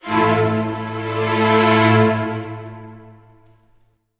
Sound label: Music Battle